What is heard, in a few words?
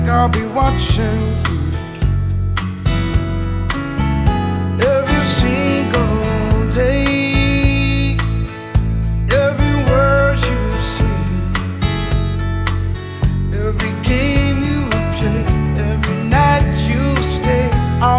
pop